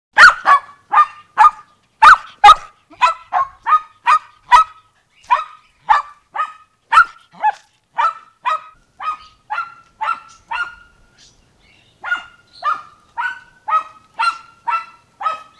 chien, animaux nature

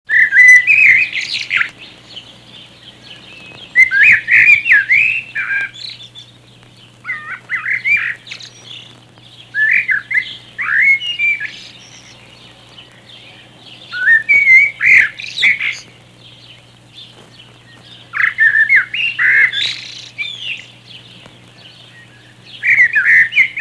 merle

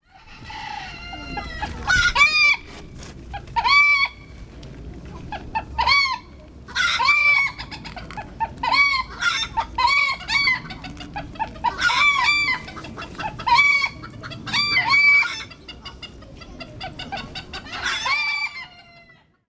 poules

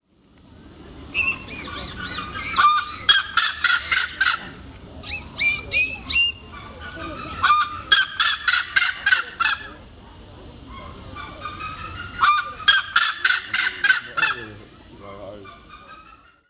Grues, animaux nature

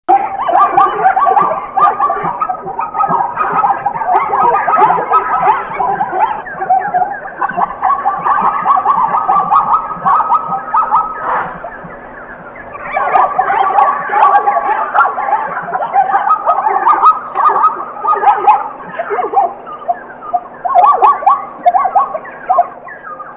zebre

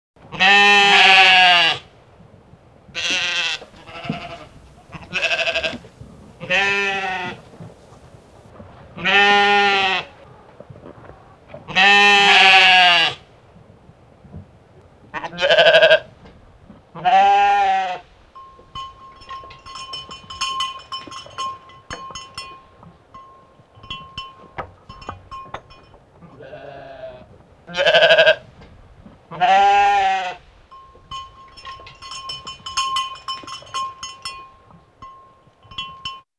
mouton

